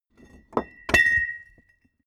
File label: small metal massive drop